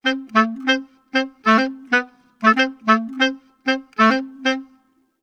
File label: 95 woodwinds (ob+clar), Orchestral arrangements, 95 A min